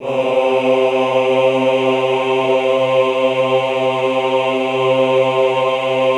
AHH C2, Classical Choir; Best Service; Extended Classical Choir; AHH MIX

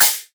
CH PERC, MISC PERC; USB Soundscan vol.60; Asian Traditions; AKAI

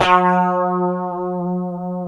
G 3 STRAT VB, HYPED STRAT, Guitars; Spectrasonics; Hans Zimmer Guitars VOL-1; 90's Sample CDs

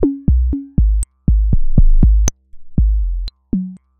Structured Rhythm, IDM Rhythms

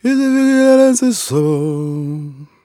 Vocal Chirp, African Voices; Quazibeat; African Secret

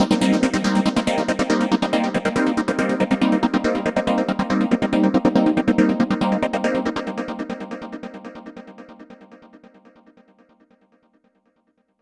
Chord Seq; Dr. Fink's Funk Factory

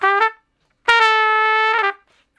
Trumpet Straight (E)

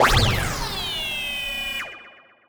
Synth FX

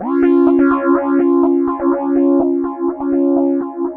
Analog Rhythm

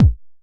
Kick, retro house samples, Drum Hits

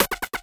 Quirky Roll, Percussion Hits; Drum Hits; Nu Dance Electro

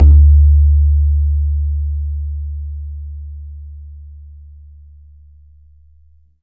BAYAN, Drums Percussion; E-MU Producer Series Vol. 4 – Denny Jaeger Private

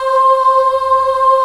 C4 child ah, choir; USB Soundscan vol.28

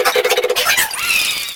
Fan Chant; Mario Paint

